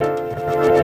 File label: Chords Tones; Equipped Music; Loungin House